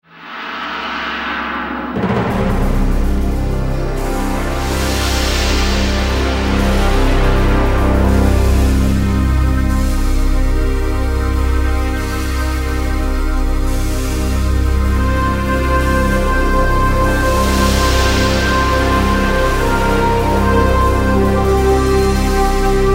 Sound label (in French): Ambiance secteur pacifique